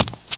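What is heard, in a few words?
run left medium, FOOT; The Elder Scrolls III Morrowind GOTY; XBOX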